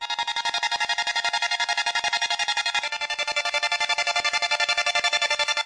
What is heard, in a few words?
chopper pad; Pads